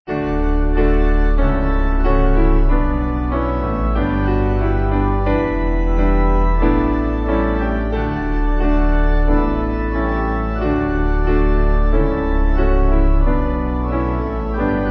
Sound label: Piano Organ